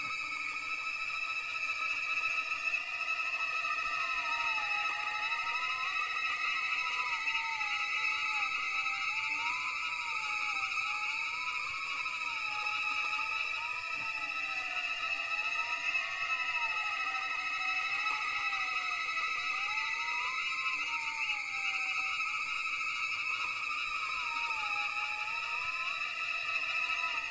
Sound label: Horror Violin Tremolo